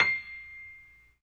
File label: PNO Grand Pno; Club-50 - Foundations Roland